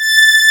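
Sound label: analog strings A5, analog synth; AKAI S6000 CD-ROM - Volume 1; SAMPLE WAVE MIXING 1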